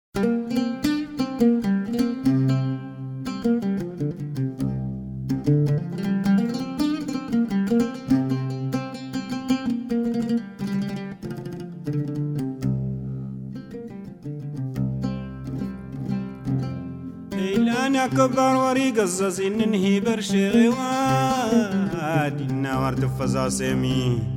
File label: Desert Blues World